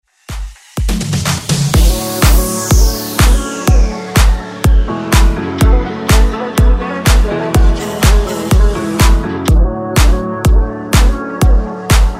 Dance

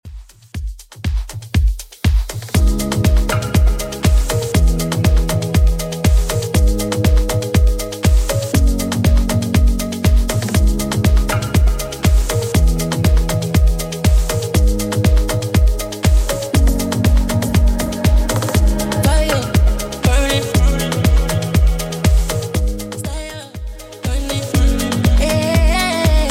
Top40 Redrum 120bpm

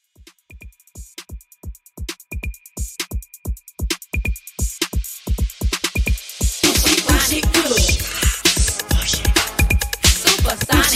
80s Dance Redrum 132 Bpm

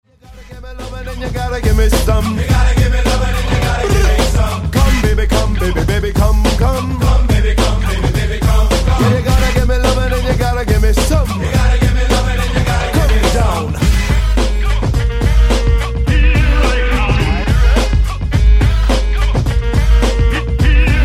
Twerk Funk 106Bpm

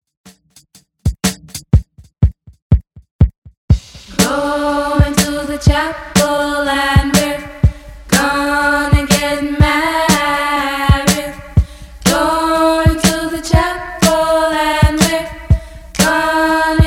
60s Redrum 122 bpm

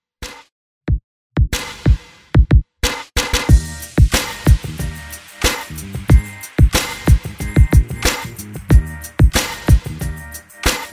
70s Ext Redrum

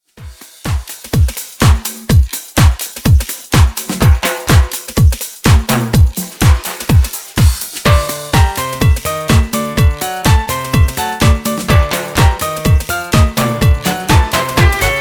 125 Bpm; Redrum

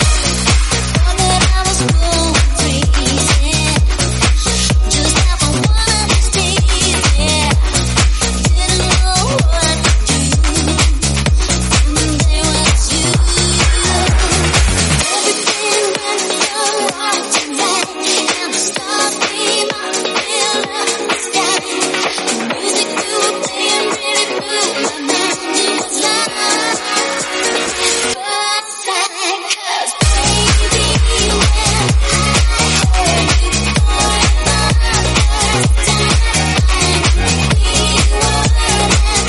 128bpm B

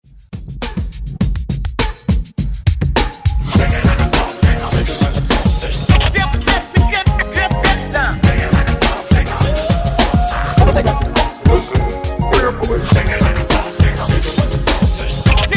90s Re Drum Remix 102 Bpm; DJDOLLS

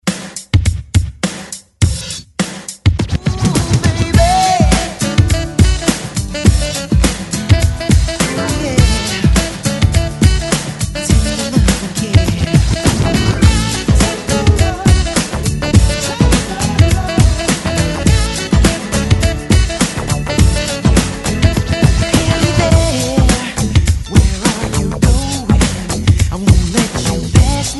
90s ReDrum 103 Bpm; DJDOLLS

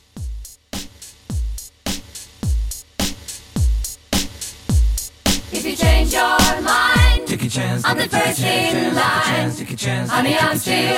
70s Pop Re Drum 106

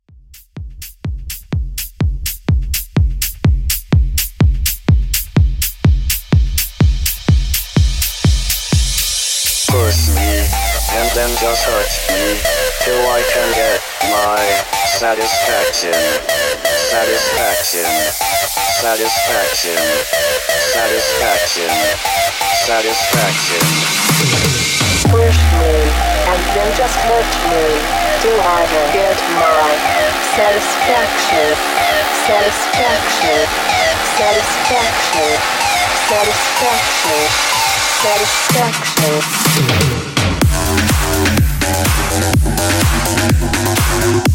Epic House 125Bpm

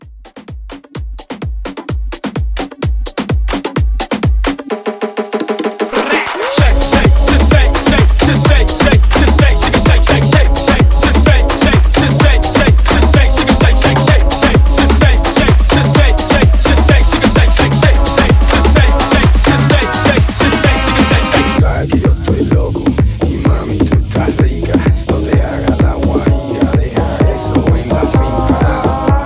Afro Hype 128

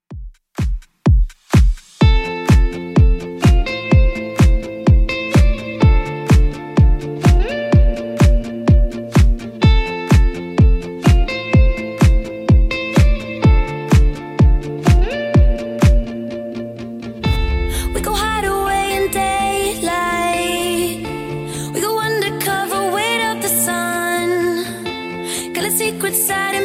2ks Redrum 126 bpm

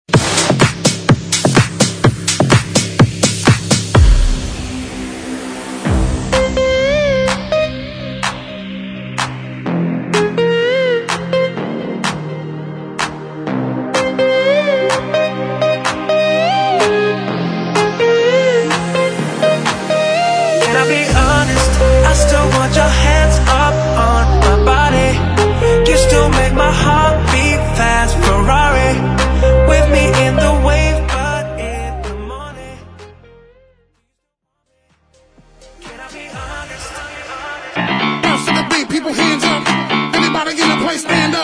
Transition

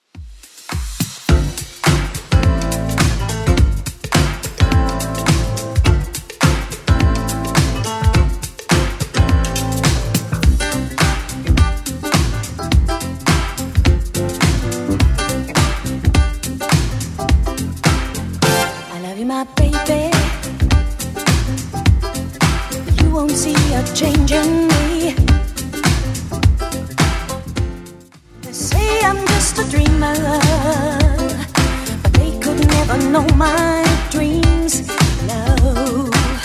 80s club 105 bpm clean

